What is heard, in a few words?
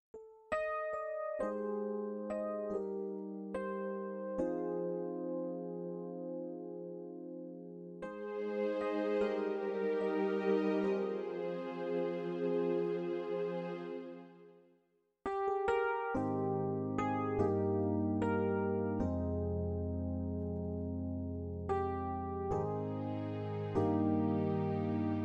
Roland RD 800 FM Piano